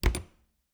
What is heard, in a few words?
Refridgerator, old fashioned, wooden, steel, brass clasps, hinges, close; Eiravaein Works; Vaeyan IV